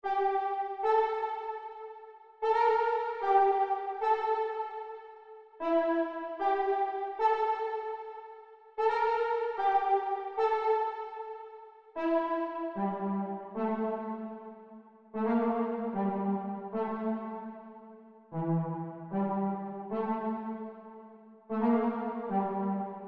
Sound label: 151 BPM D Min Pluck; Loop Stems; Cymatics; 2022 Melody Collection Platinum Expansion; Mutant